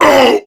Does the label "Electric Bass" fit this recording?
No